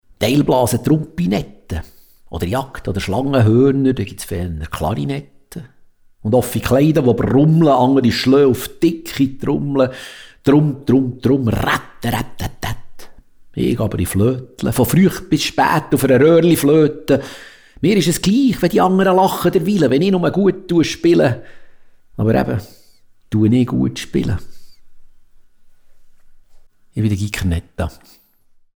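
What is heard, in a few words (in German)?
Röhrliflöte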